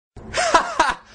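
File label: hahaha message alert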